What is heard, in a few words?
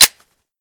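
slide release, deagle; g17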